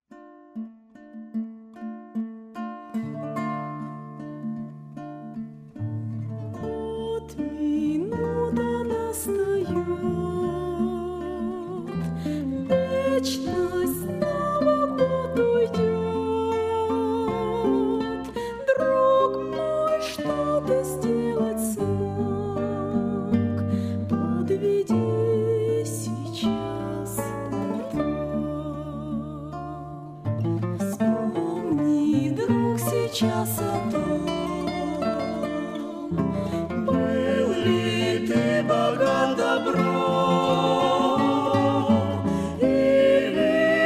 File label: guitar; vot minuta nastaet